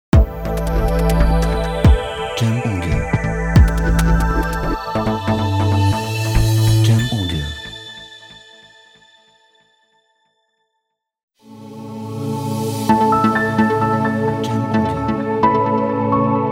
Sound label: Tech Logo; Tech Logo Pack